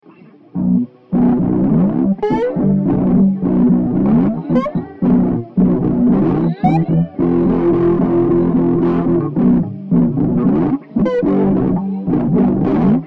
Slide metal song; Hylics

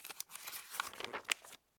SFX Page Turns Book; The 39 Steps

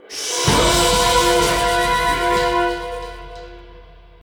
4th 8th Place Goal Fanfare; Tiki Turbo

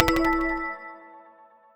Checkpoint; Gear Gauntlet; Wii U

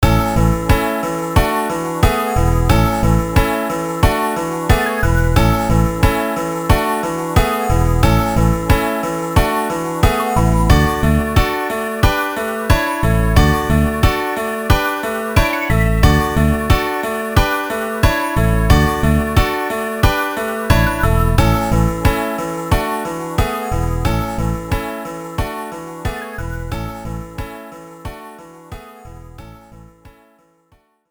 Menu; math play ds gamerip 2007; Equal Shuffle Menu

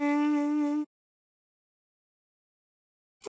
Pungi Note

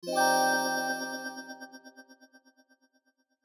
Clear Em7 High

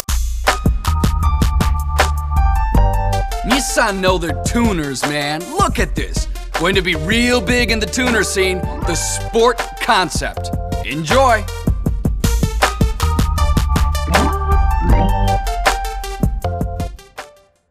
Reward; Midnight Club 3 Dub Edition Remix; Xbox; Rsport

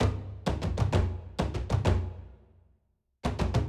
Perc Wood Lgt Intro; unruly heroes

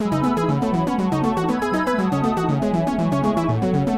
Happy Town A - Electro

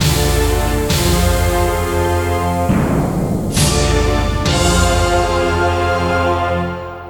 LOOP music ball out; Hercules

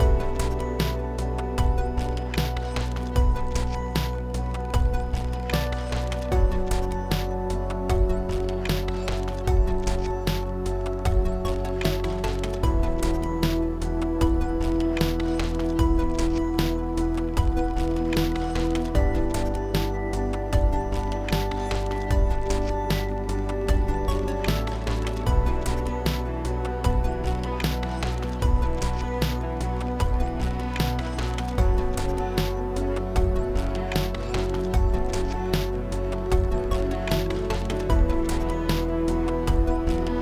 Exploration 8CH 76BPM

